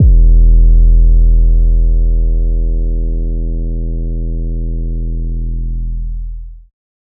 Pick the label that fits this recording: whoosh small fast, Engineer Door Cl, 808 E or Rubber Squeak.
808 E